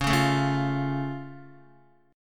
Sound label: Db Augmented, down, Guitar; strummed; JGuitar